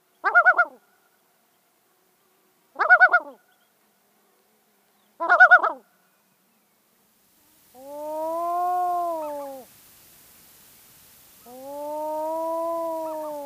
Yellow-billed Loon

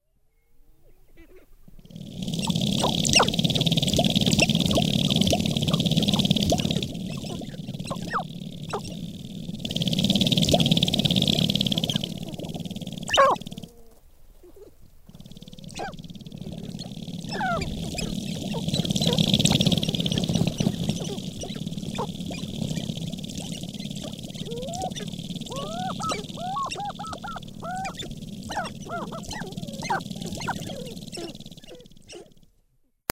Sharp-tailed Grouse

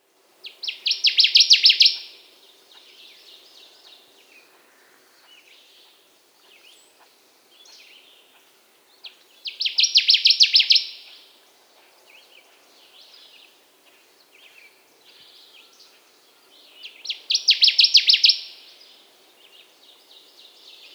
Connecticut Warbler

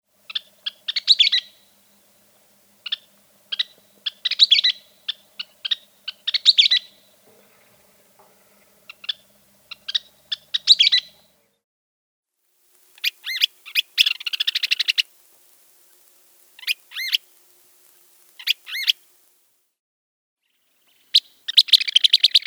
Western Kingbird

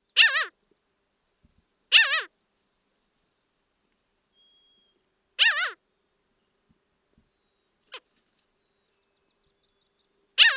Parasitic Jaeger

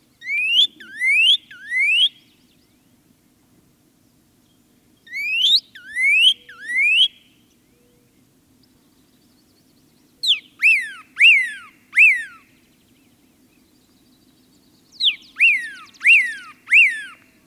Pyrrhuloxia

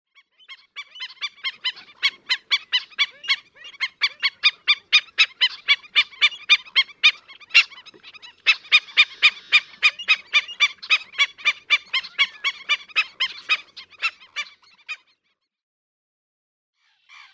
Black-necked Stilt

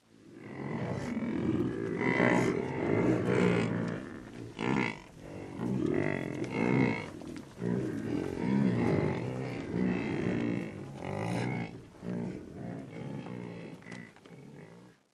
Bison herd grunts

